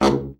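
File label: Sax, baritone; Giga Samples Collection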